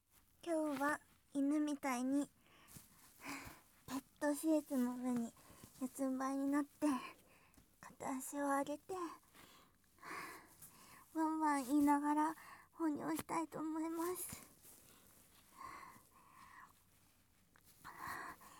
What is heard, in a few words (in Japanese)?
犬ポーズで！ワンワン言いながら！オシッコですッ！(レコーダー)